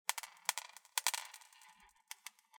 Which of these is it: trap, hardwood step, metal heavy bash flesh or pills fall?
pills fall